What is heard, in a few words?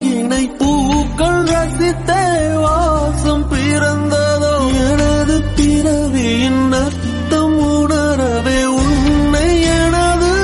SONG RINGTONE